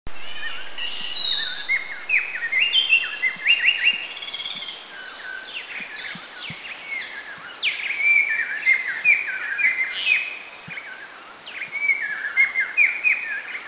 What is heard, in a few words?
Balicassiao Subic; Dicrurus balicassius; Philippines; Country endemic